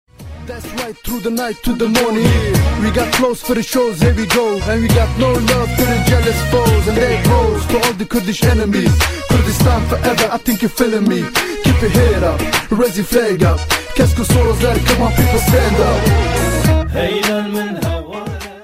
Rap

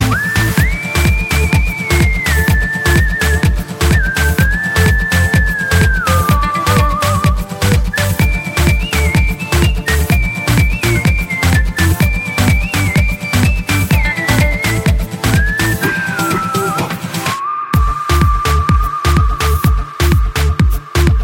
Whistle

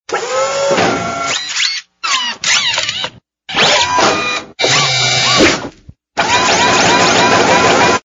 Digital Robot

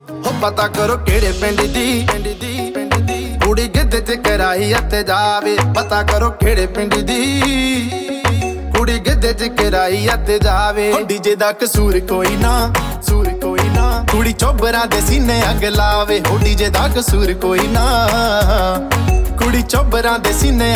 Punjabi

